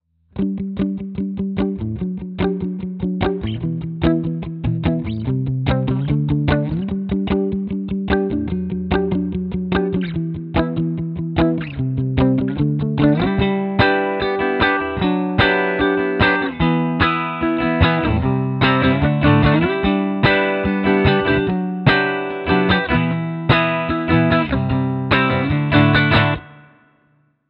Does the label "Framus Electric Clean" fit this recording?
Yes